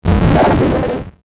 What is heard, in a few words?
Multimedia Internet CD-Rom Flash Animation